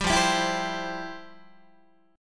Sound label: F Major 7th Suspended 2nd, F, down, Guitar